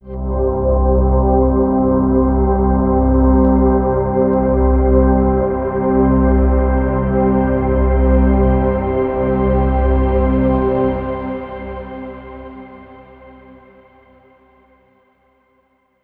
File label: Downtown Overlay Drone